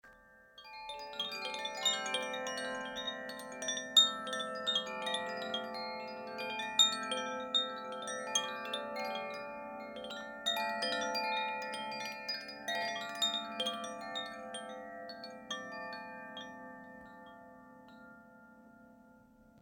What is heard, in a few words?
koshi aqua terra, carillons Koshi; carillon koshi terre; tresors esoteriques